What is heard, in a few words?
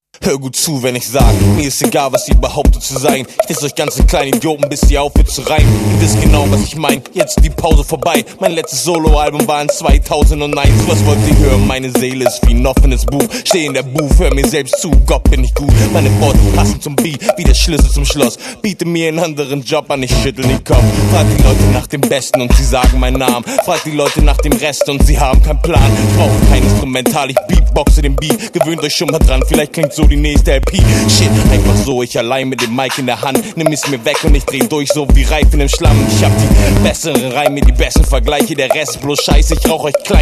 Beatbox Skit